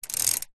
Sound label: vehicles bicycle pedal backwards short wheel hub clicks